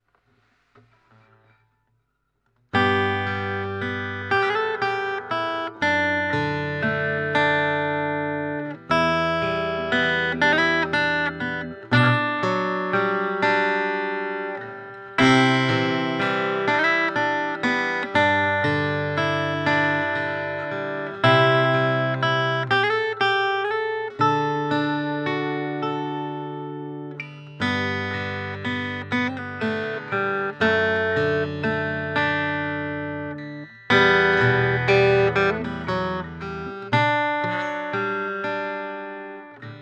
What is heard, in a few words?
yamaha fx720s